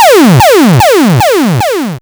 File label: Player Was Hit